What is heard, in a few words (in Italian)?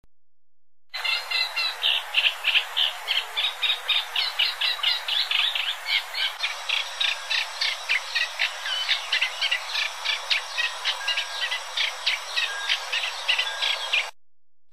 cannaiola